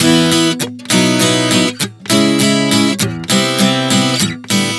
E-B-C#m-C 100 bpm, acoustic guitar chord progressions